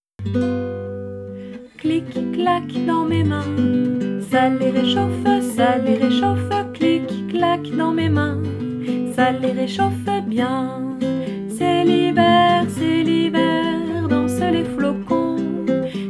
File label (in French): clic clac dans mes mains